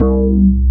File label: BASS, 130 BPM, Drum & Bass; Ueberschall; Drum & Bass Flash Trackz